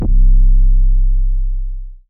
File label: 808s; TM-88 808s [Purp]